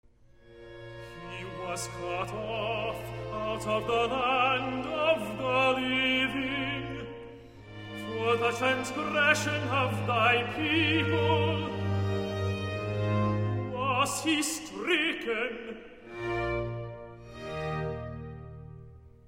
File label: He was cut off out of the land of the living - Tenor Recitative; Boston Baroque; Handel Messiah